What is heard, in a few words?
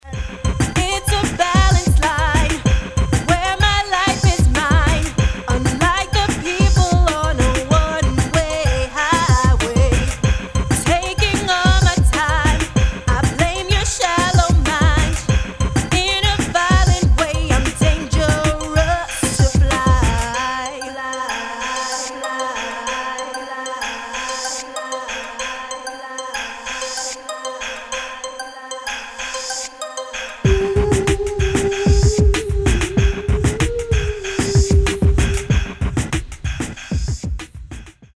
Electronica